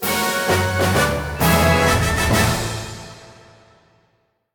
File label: win Large; robin hood